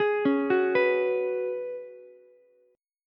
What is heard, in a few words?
F sharp Suspended 2nd Suspended 4th, Ukulele; arpeggiated; JGuitar